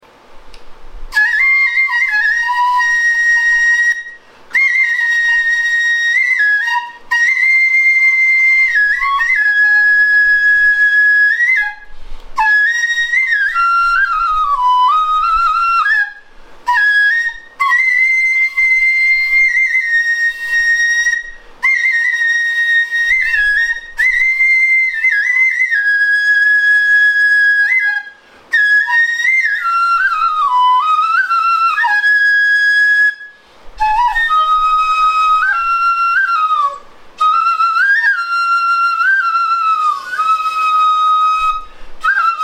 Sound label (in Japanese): fue michi; geinou; furyu; mouri